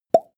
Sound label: plop; koerpergeraeusche; menschen; soundpool